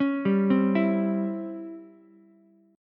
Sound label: C sharp Minor Major 7th Flat 5th C sharp down Ukulele; C#mM7b5; arpeggiated; JGuitar